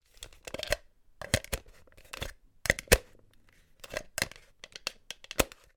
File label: toy potato head movements